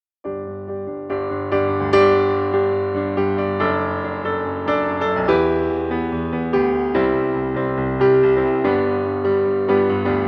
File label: Accomp; Nala; FOLK